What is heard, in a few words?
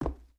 Foot Wood Boot Walk; footsteps